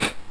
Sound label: door unlock; chroma solstice